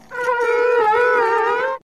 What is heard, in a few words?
slide slip